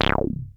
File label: ARP 2600 C2; Hollywood Edge - Giorgio Moroder Rare Synthesizer Collection